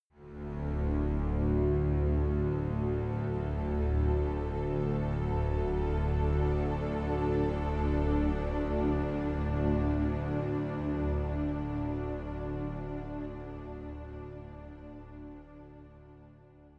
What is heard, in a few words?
drone simple strings; musikelemente